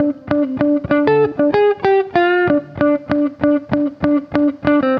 FUNK, FUNKY GTR

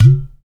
UDU, African

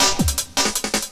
LOOP SD; Ueberschall; Drum & Bass Resonance

